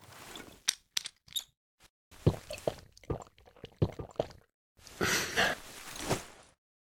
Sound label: hercules use; STALKER; IWP; item usage